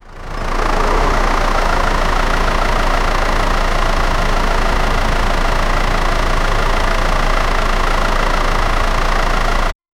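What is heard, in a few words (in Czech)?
zvuk volnoběhu a postupného, bus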